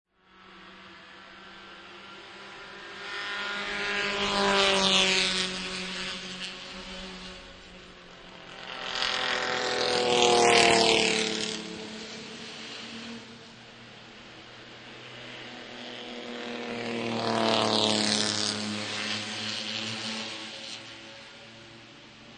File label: Racecar 6Cyl 3By, Three 1960 race cars by, 6 cylinder; Vehicles